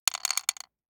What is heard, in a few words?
DICE on Wooden Tray, Throw and Roll, Mini, 6 Six Dice; Samples; ArticulatedSounds; DICE Sfx Party (Extended)